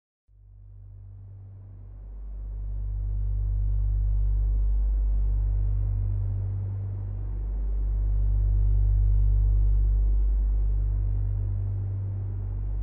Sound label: hemisync sleep deeply